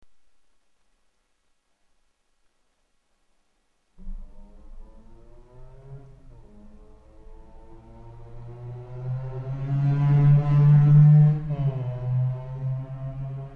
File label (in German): Schallschutzfenster